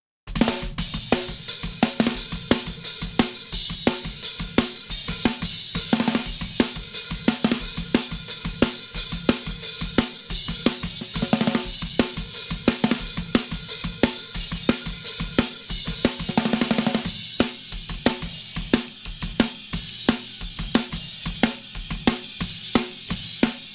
Snare Drum

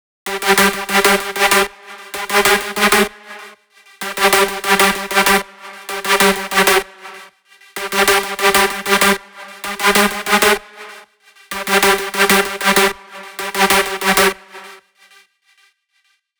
128BPM Spring Brake Kit, Future Big Room; Shockwave(PIL) - Future Big Room vol 1

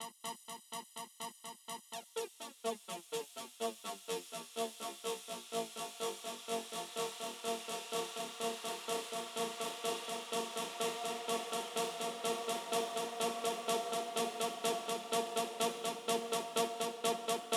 Breakdown

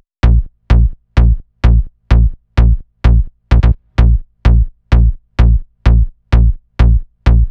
128BPM Bass Loops A#; Tuned; MELBOURNE DROP VOL. 4

